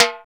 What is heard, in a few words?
POP TIMB RIM, TIMBALES